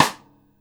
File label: rim, Drums Acoustic; USB Soundscan vol.10; AKAI; 90s sample CD